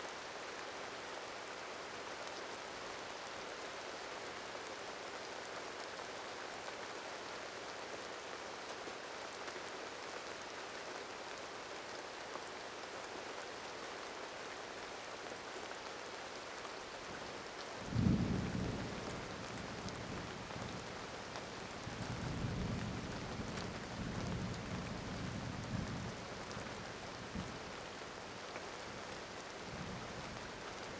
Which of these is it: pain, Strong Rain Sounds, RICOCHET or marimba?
Strong Rain Sounds